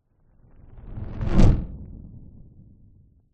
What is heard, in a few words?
nature fireball pass by large designed